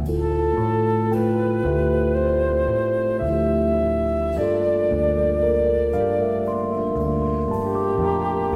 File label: flute, Loops, Sad